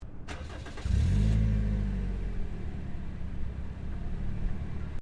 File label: CAR TOYOTA COROLLA IGNITION EXTERNAL STEREO, hatchback; 2009 Toyota Corolla; Cars; Vehicles; SoundEffects-Foundation1